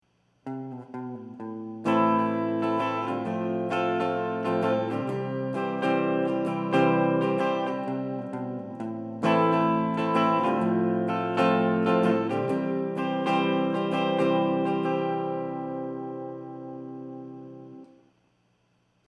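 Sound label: electric guitar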